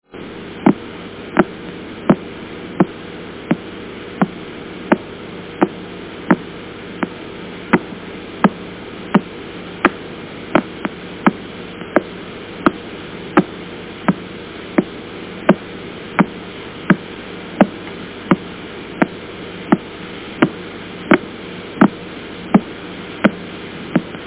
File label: Door Knocking; Pulsar Sound